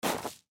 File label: Footsteps Tennis Shoes Wood Chips Slide; Foley; Footstep Sound Effects